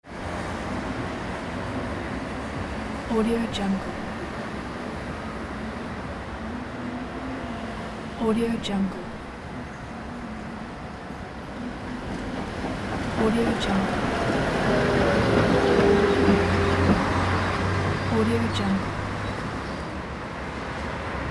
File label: city ambience noise; danielmix